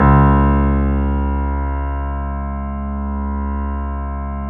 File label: BOSENDORF